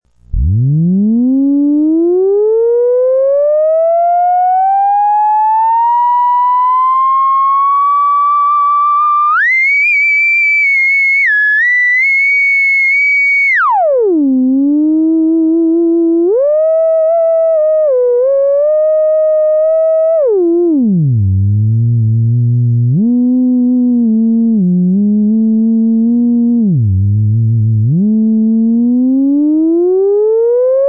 tube linearity, theremin

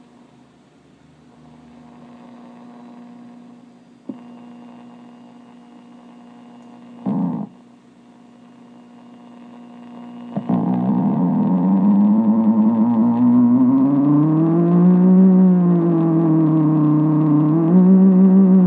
b3 tone sample, theremin

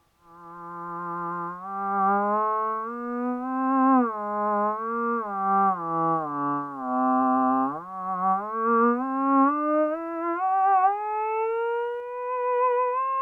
ews resonator, theremin

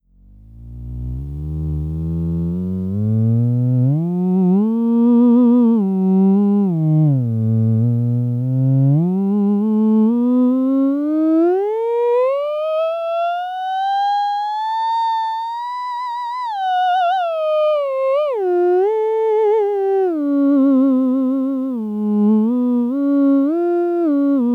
ews enhancer mic, theremin

